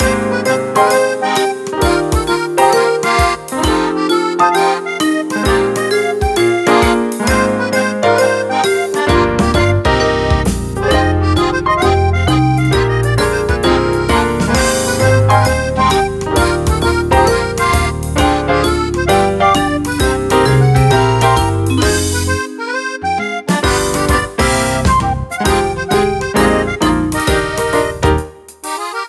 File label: Humorous, ringtones; ZTE; L111 Blade